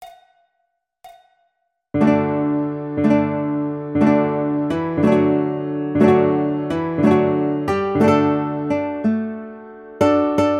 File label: Row Row Row Your Boat Guitar